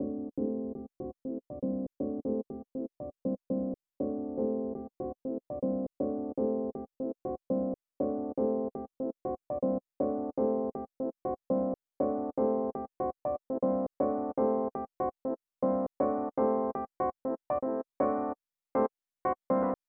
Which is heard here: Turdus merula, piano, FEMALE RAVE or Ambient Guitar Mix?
piano